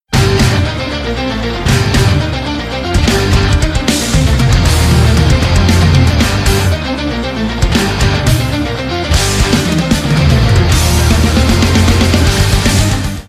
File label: intro music; ff2; zanki